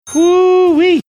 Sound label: "hoo wee"